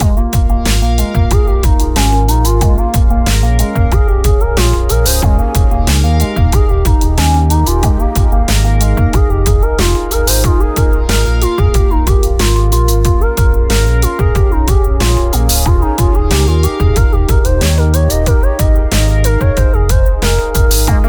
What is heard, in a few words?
Loop